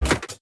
turbo off; buttons; Zhao Cai Tong Zi

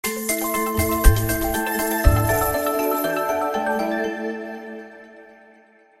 celebration; EggAndRooster

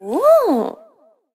mouseover right girl; Wazdan

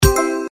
hit; CaiYuanGuangJin

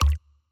reels stop; Hungry Shark; Wazdan

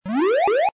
gamble win; American Poker The Legends II

